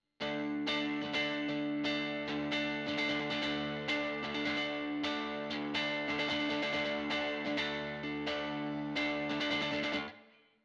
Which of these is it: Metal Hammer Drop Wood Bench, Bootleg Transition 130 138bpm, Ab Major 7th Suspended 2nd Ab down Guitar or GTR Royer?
GTR Royer